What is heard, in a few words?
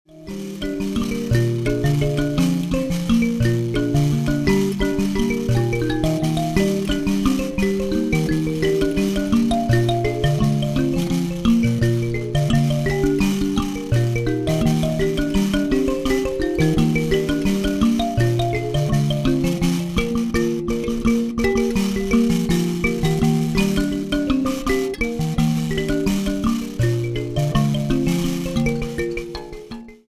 mbira